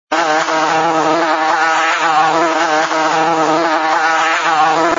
wasp

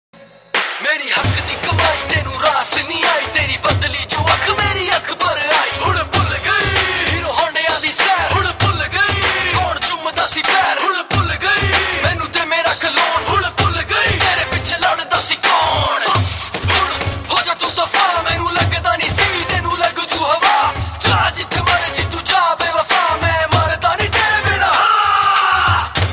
dhol

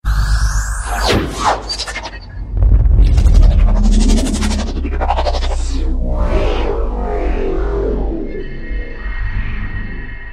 Transforming Sound

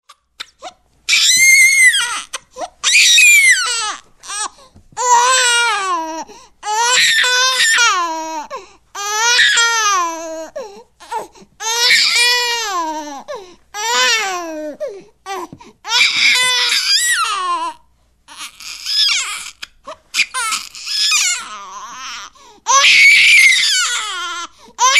Baby Weep, Funny baby ringtones

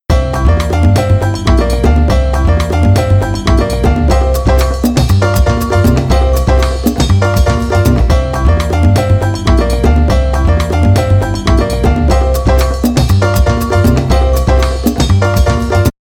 Latin dance; Original Ringtones Sony Ericsson T707

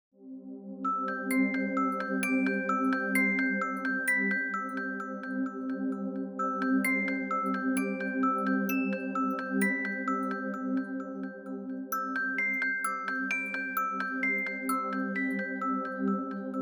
alarms, Ringtones; stars; OnePlus 6

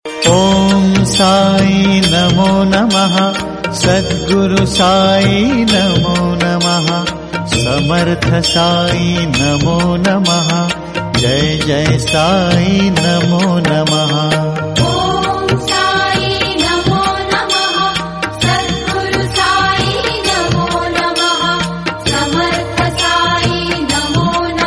Sai Mantra, Devotional; Sai Baba; Ringtones

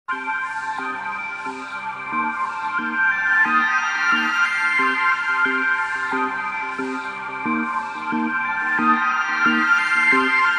Ambient Chillout; Ringtones

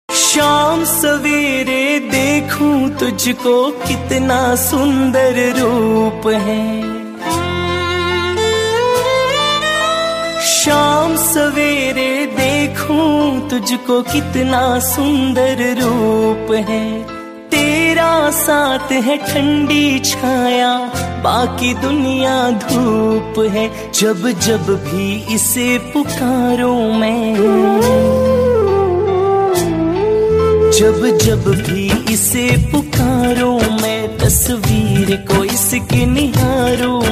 Bhajan Song Ringtones; Devotional Ringtones

